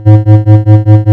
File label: Hit UFO; Toy Story Mania; iOS gamerip